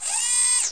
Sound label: display servo in